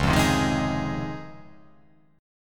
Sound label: C sharp Major 9th C sharp